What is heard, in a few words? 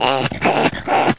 Sick Chihuahua